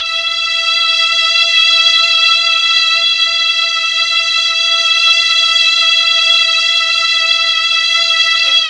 E3 16 VIOLIN, Mellotron; TRON 16VLNS; Mike Pinder - Mellotron Archive CD

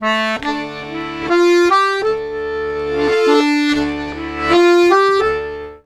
Accordions; USB Soundscan vol.40; AKAI

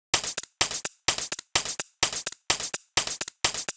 Hihat 127bpm, House Drumloops; 90 s Sample CDs; Zero-G House Fabrik Drumloops; ZG Drumloops

